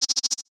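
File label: Stealth Surveillance Sci Fi UI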